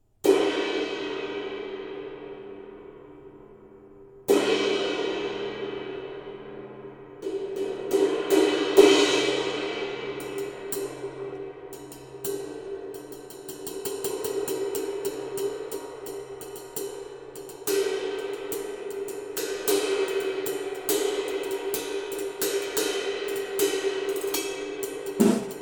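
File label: Zildjian K Custom Hi Def Ride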